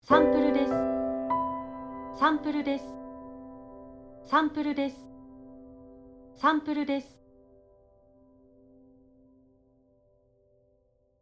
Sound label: Piano